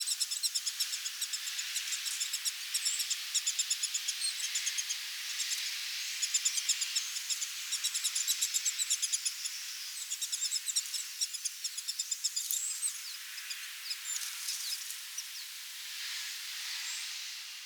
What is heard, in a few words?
Stellers Jay